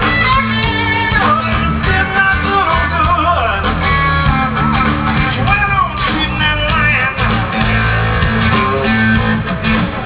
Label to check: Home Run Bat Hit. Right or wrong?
Wrong